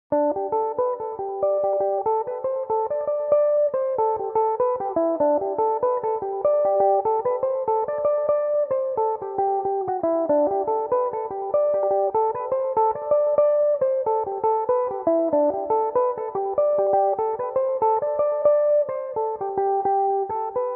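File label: G major, Irish mandolin; delia keanes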